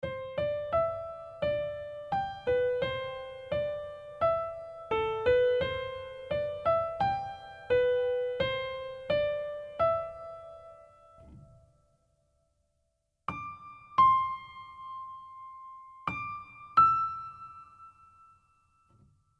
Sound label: Inter melody, instrumental arrange